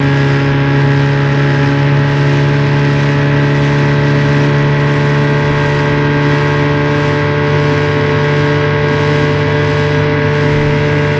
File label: Motorboat Drives, motorboats